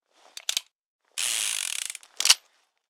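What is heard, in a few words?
weapon pistol 44 magnum jam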